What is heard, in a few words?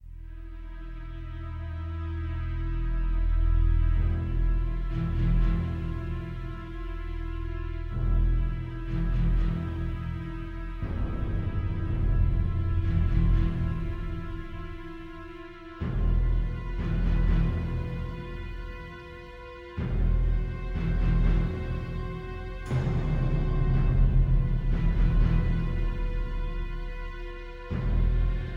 Evil Ambient; windows